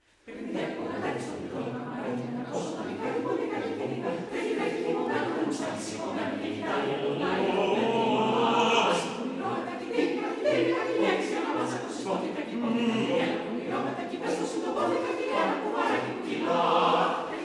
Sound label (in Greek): Η Χορωδία της ΕΡΤ